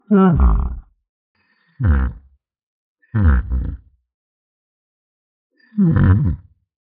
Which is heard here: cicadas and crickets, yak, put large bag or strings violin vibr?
yak